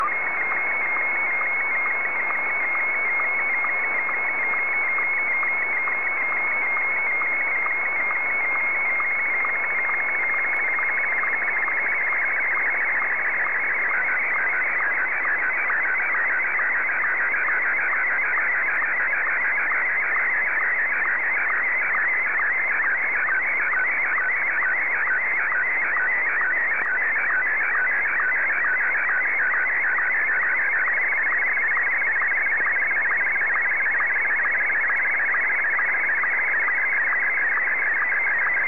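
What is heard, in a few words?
Martin 1, slow-scan television; VK3DFL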